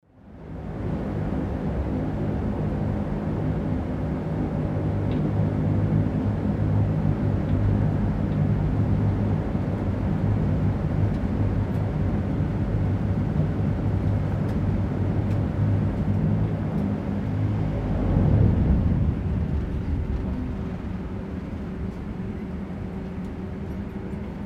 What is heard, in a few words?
turboprop people get out